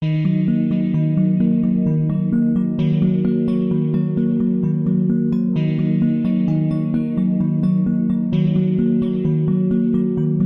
Drone, Light

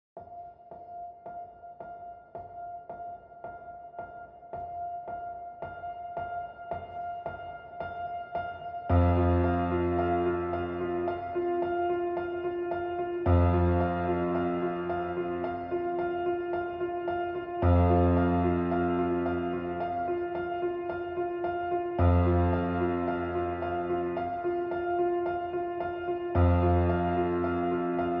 Piano Only

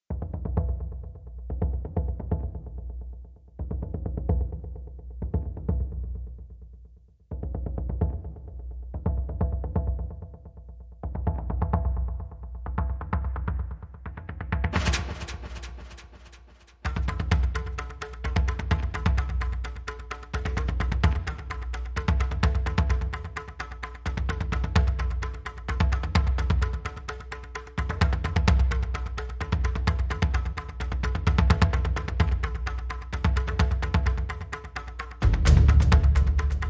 Clocked Strings, Reduced Arrangement

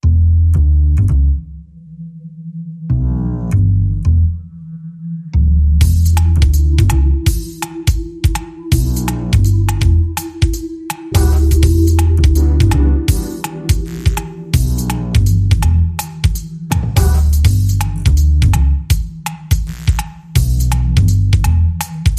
Chill Swing

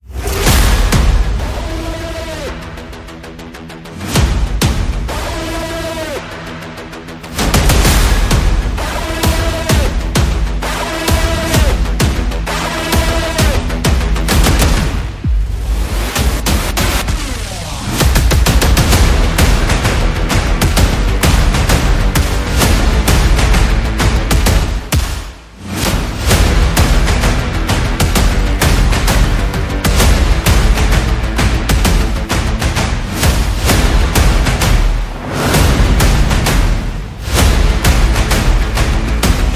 No Lead Synth

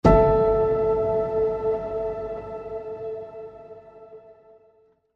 Placid Lake Faint Piano Ping

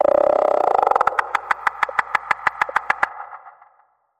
Undead Zombie Calls

